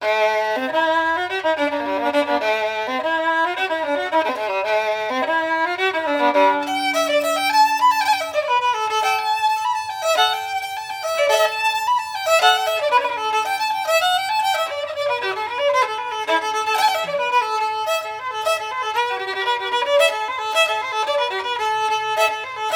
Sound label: Traditional, keman